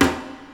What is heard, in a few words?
KOREAN TAIK; Crypton - DrumBase5000